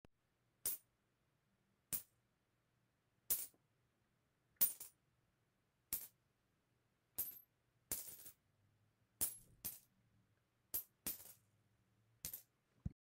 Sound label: Coin tossing